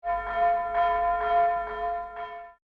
Env Bells; Joan of Ark; Windows

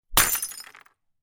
vase break; Octodad Dadliest Catch

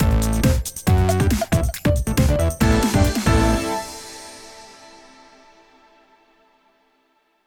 End Stinger; boomerang fu

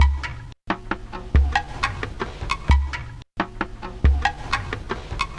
Summer Drums; Proteus; gamerip 2013

